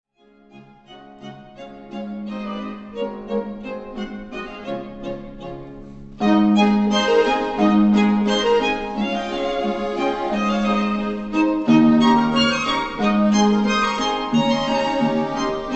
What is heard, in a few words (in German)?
Polka, Schrammeln; Johann Strauß Vater, Arr. Alfons Egger, Estrella-Verlag Wien; Wiener Kreuzer-Polka op.220